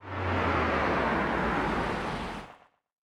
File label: Car Come